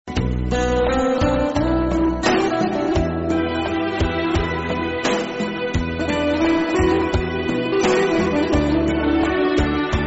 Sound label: sad turkish music ringtone